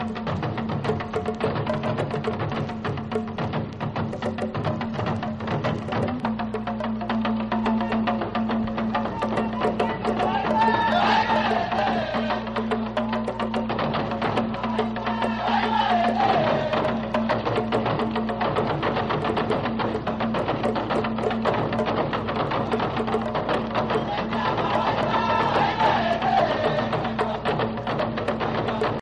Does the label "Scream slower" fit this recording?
No